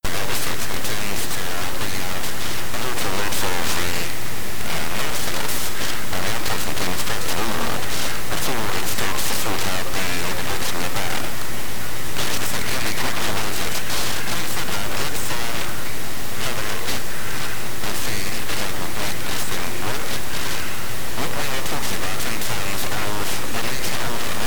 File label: silent in ear recording with bone conduction mic after amplification